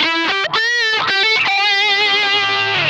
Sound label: OVERLICK HI, Funk Guitar; 90 s Sample CDs; Zero G